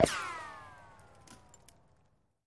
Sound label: Bullet Ricochet Sin